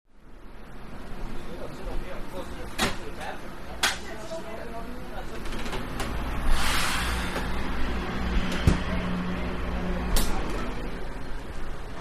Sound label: Boarding Commt Train, subway